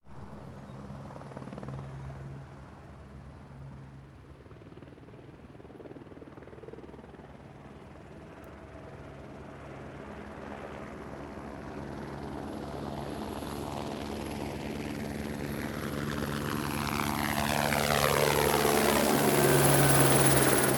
chopper; Zomboid 41.78.16; Project Zomboid

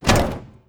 Vehicle Crash; Zomboid 41.78.16; Project Zomboid

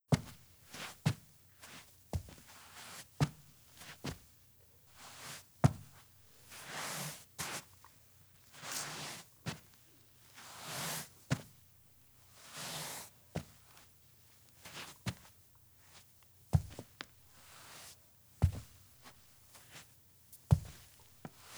foot scrape male indoor close; Zomboid 41.78.16; Project Zomboid

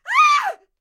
dist scream; Zomboid 41.78.16; Project Zomboid

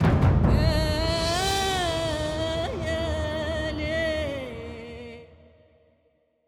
level up; Zomboid 41.78.16; Project Zomboid

